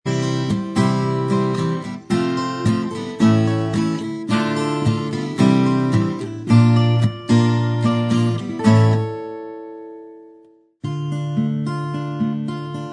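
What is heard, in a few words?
acoustic version instrumental, backing track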